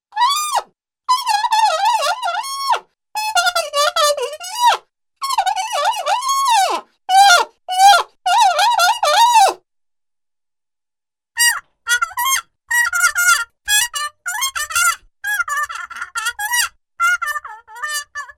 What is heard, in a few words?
Plunger Muted Trumpet; Cartoon; Hollywood Edge; Cartoon Trax